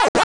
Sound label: munch; pacman